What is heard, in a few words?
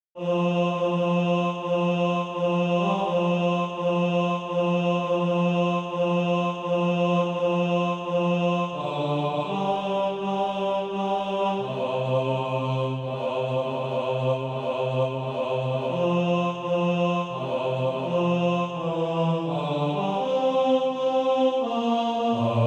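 bass; SATB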